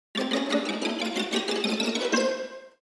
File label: Cartoon suspense, Cartoon Orchestral